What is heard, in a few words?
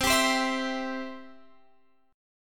C Major, C, down, Guitar, Open F; strummed; chord; JGuitar